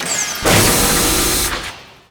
Elec Energy Charge; Energy & Forcefields

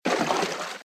Footsteps - Water - Shin Level; RadwinIsland

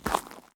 zombie footstep; DeadFright

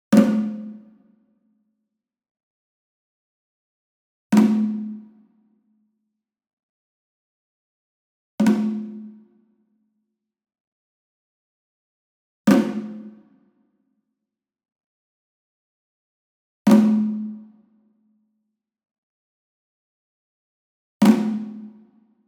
SMALL DRUM Tambora Flam Hard Beater Medium; boom library; Cinematic Strikes Construction Kit

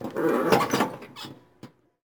Theater Seat Squeak Stand; SFX Kit

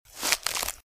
Footsteps - Bush walk - Right; Eden; RadwinIsland

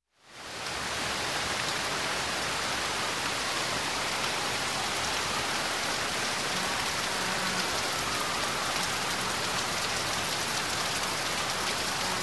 Rain, Heavy Flow From Eaves; Sound Ideas 2000 Series

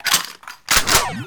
Gun Reload Scifi, Weapons Foley; SFX Kit

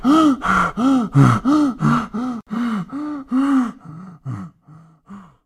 up from water breath; RadwinIsland

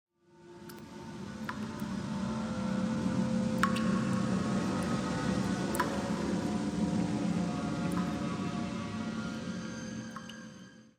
alley; Pitch Black - A Detective's Demise

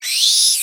MONSTER Insect Squeel Bright; MONSTERS & CREATURES; mono; Universal Sound FX

